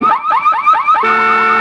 SIGNALKA CAR; 30 Days to Survive

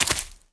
land vines; Scrat

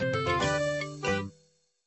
Music New customer; papas cupcakeria online windows gamerip 2013

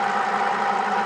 Camera Pan; Five Nights at Candys

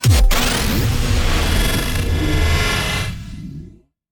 Low Cinematic Impact

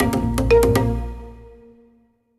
music world music classic outro

